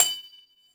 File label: grenade launcher casing eject